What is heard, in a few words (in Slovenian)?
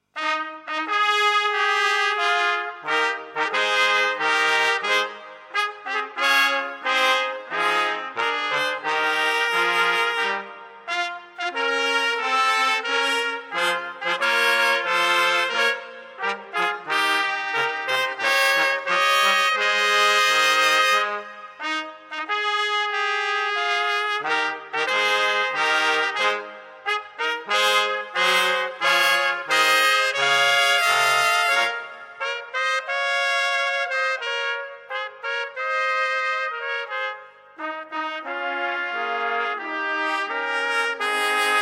trobilni kvintet slavnostne fanfare